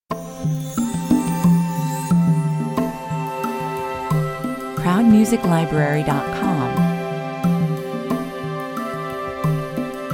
Very floating piece with pads, string and congas, 90 bpm